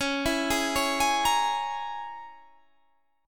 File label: A sharp Minor 7th Flat 5th C sharp